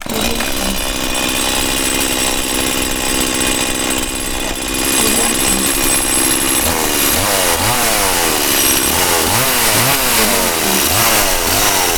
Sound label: chainsaw